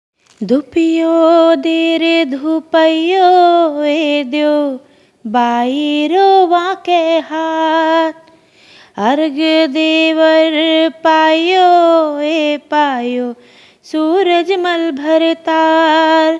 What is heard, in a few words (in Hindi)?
धुपियो, धुप को गीत; गनगोर गीत